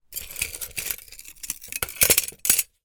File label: foley glass peices shards handling movement